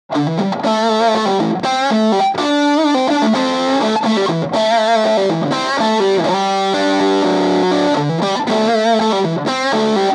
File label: Crush 12 Cranked Riff